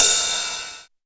Ride, Rides; Electronisounds

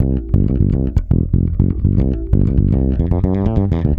C 120BPM, PEDULLA BASS; Masterbits CLIMAX 7

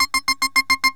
waiting, signals; AKAI; FX SIGNALS

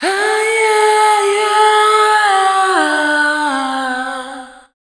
Dance Vocals, FEMALE

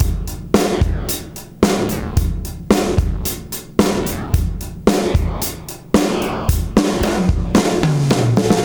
Beat Loops; Zero-G; Monster Beats

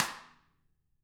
Drums, SNRR; CAMCO

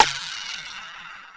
RAIL BUZ; Rarefaction; Poke in The Ear With A Sharp Stick

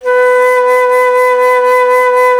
Alto Flute, Solo Instruments

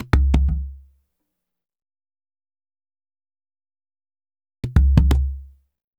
SW. CYMBAL; Spectrasonics; Liquid Grooves 1 & 2

